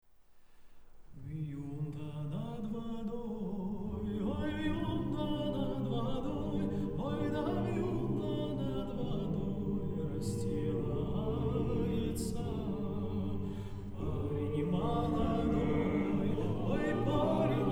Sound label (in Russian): Хор